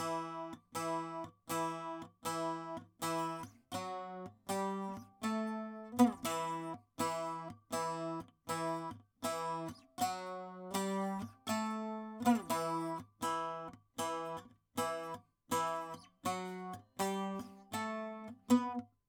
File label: saz